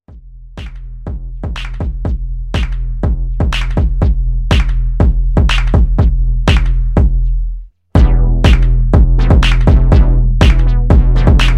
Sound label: Mixshow Re Drum 122